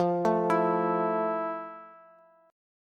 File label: F Major 7th F, Bass; arpeggiated; JGuitar